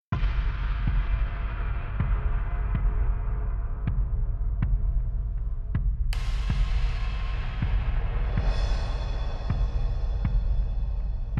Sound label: road dark slow